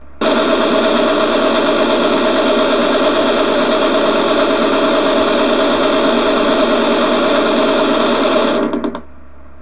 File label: machine gun burst; ramdemos